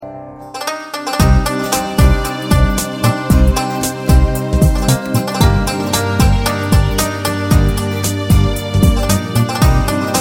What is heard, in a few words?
Ringtone Mobile